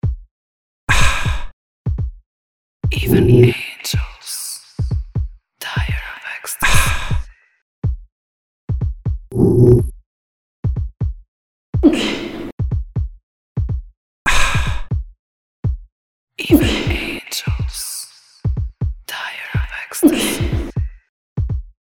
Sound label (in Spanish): respiraciones efectos sonido